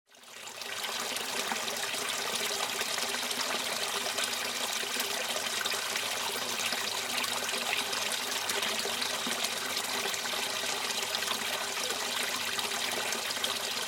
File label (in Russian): struja vody letsja v kamennyj bassejn fontana